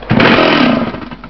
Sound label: Chainsaw Ignition; Amiga